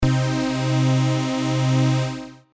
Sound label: Chord Lead vca